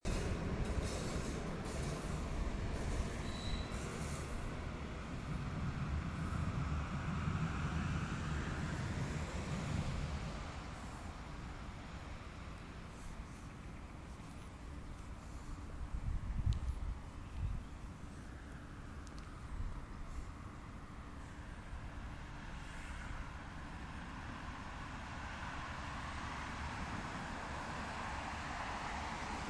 street noises

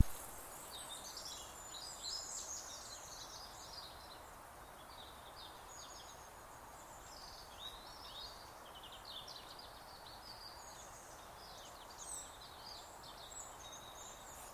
towpath birdsong

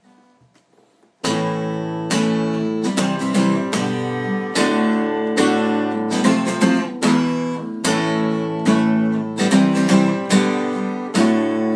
simple progression

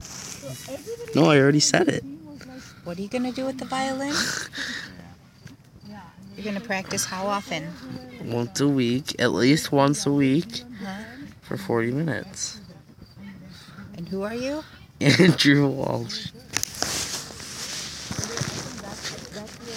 violin

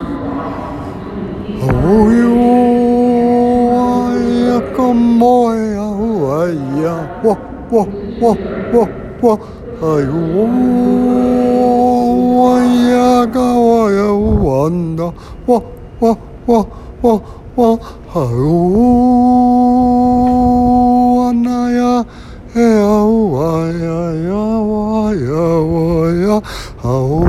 subway song

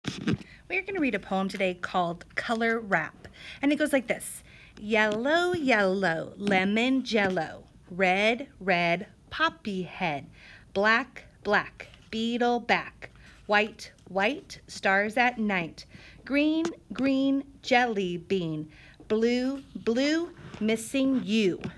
color rap poem

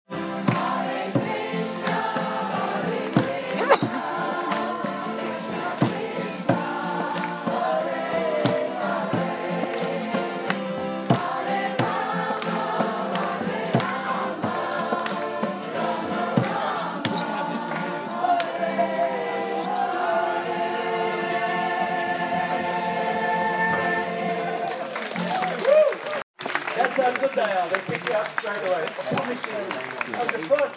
singing the hare krishna mahamantra

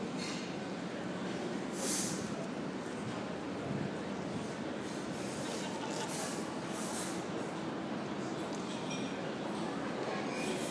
outside work

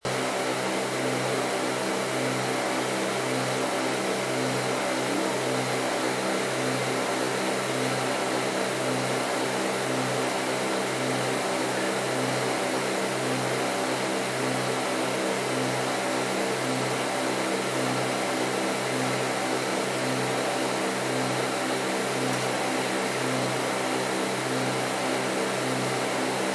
aircon white noise bus

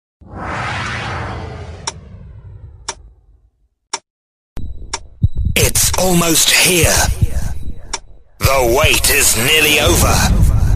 radio jingle

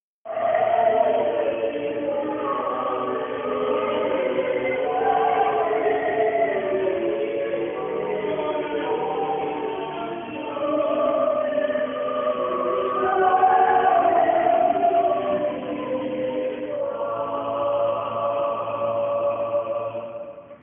sounds of glasgow cathedral

